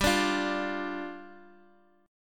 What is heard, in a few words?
D Minor Double Flat 5th, G